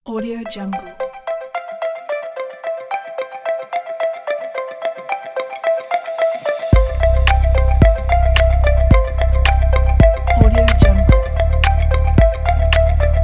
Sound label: ambient technology sound